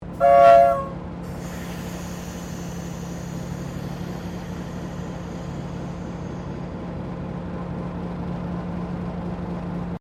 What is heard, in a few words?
Train Diesel TGR X Class 1951 Tasmanian Whistle Venting; Bellarine Tourist railway vehicles; Trains